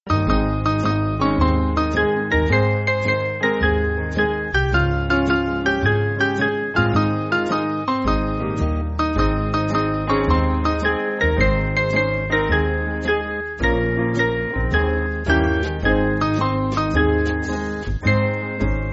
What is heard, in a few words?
Band; Swing Band (CM)